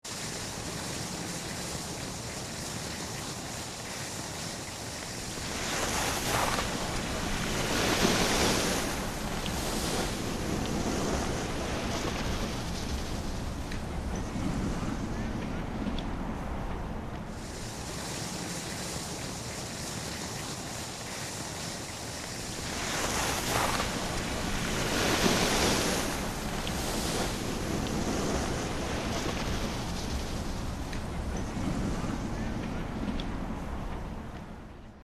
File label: Ski ambience mid slope distant ski lift